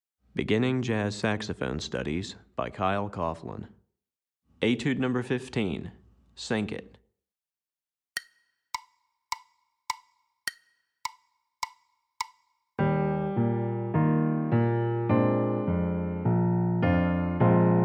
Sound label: Piano Part, Beginning Jazz Saxophone Studies Alto